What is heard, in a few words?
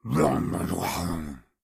Dino growls; SyrinScape; Syrinscape unplugged; Jungleplanet dinolaser battle